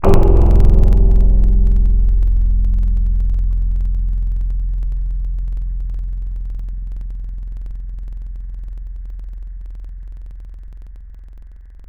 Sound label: African Guitar C3; Synthetic's Serum Collection; Echo Sound Works Sphere